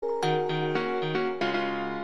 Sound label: Glass bead